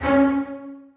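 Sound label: basses staccato c3; Sonatina Symphonic Orchestra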